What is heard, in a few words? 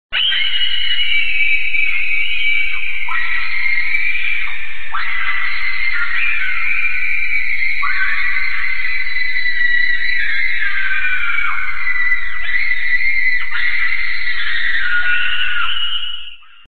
scream and scream